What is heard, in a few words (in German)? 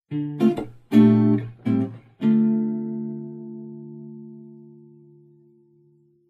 Jingle ohne Rauschen